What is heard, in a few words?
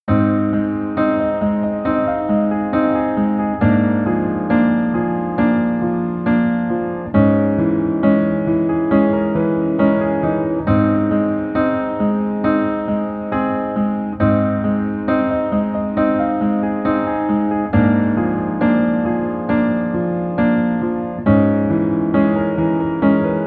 Piano